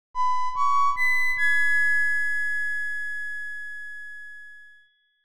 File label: Echoing Flute; Dragon Quest III